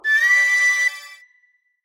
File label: Transition; Worldmap Rakoo; rakoo friends wii u 2015